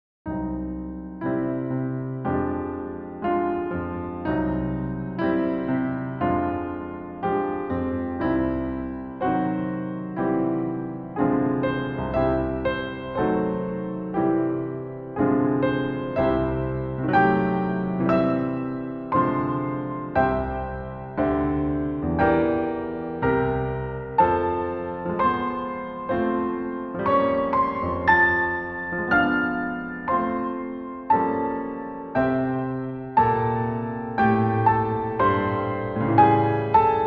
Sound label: mouse natural movement